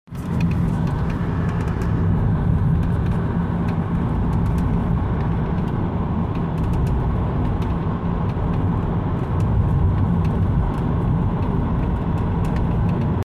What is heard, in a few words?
2015 s3 creaking